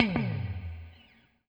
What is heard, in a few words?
NOIZ, Guitar; 90 s Sample CDs; Quantum Leap - Guitar & Bass